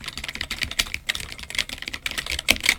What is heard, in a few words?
sound test; voyager